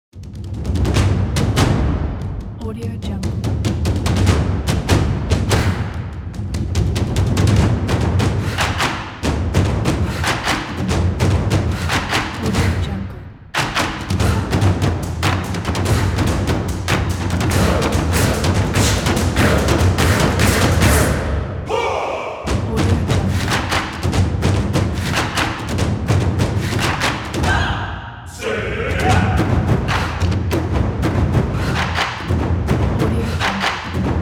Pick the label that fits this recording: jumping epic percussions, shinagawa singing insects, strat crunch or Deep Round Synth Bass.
jumping epic percussions